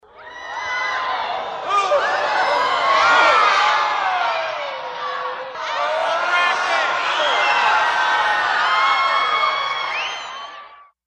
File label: Theater crowd, horrified oooh, frightened